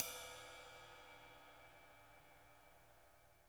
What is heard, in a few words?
RIDE; Sonic Reality; Interactive Drum Kits; 90s sample cds